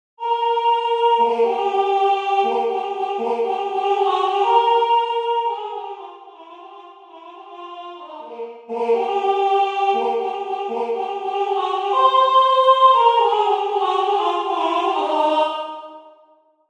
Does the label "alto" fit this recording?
Yes